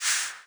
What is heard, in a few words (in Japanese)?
足音; LcdDem SFX